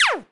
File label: laser; Geschicklichkeit; Bricks Of Egypt